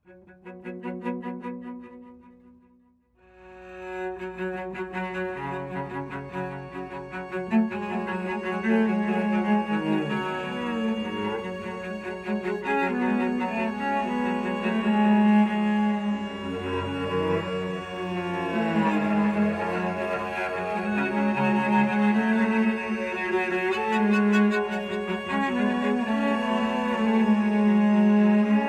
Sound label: cello